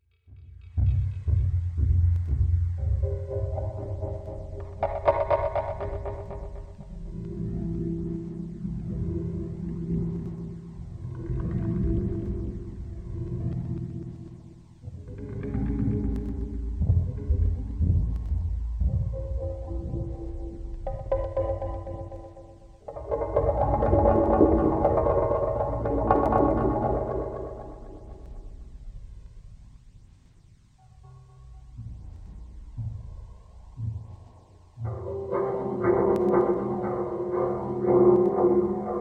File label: Ab Mixolydian 60, Deconstruction